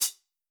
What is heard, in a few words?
Crisp Angry Foot, Hats; Airwindows